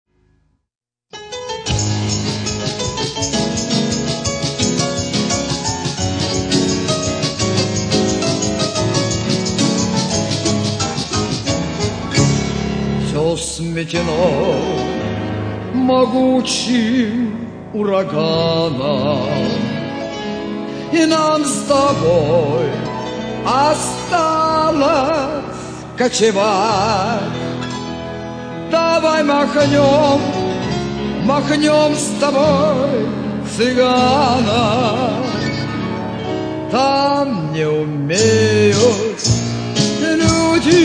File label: Play My Tambourine